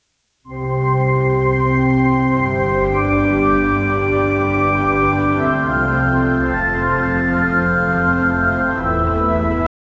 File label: an angelic music with a